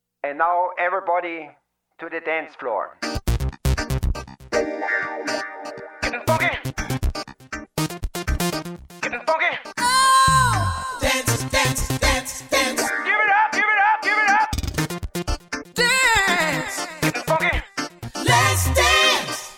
Vocal Planet Dance

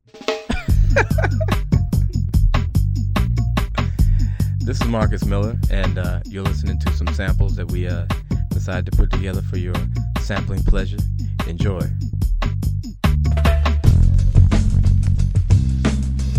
Bass Phrases; Bass Legends

